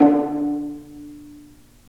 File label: STR PI, Roland String Master Series, Vlas Pizz